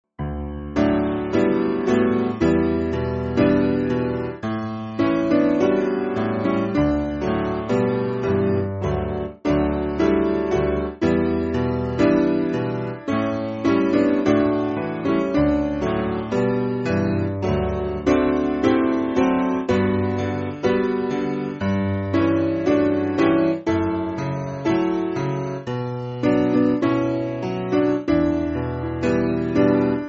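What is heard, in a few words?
Piano, Backing Files for Churches; When We Are Living; Somos Del Senor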